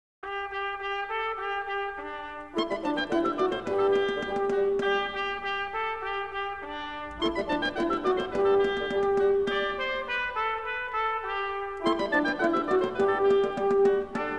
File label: instrumental; Good King Wenceslas (English traditional